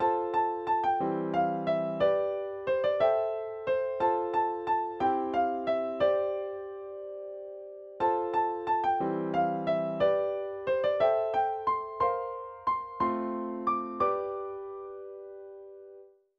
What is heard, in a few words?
instrumental